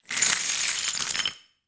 gravel drop, gravel debris

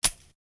magnum handling

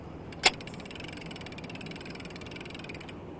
fishing reel

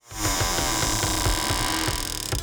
large door squeak

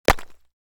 stone drop

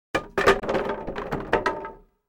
woodpile drop

